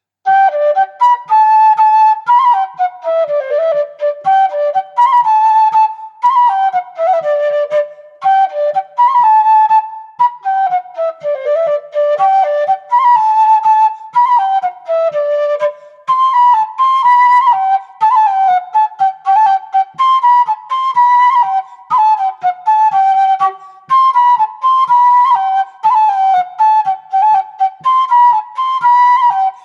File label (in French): Rigaudon